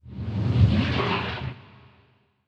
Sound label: water lap; nuke